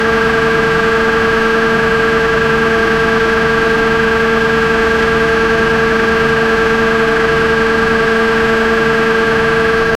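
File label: diesel generator idle, machine; 1920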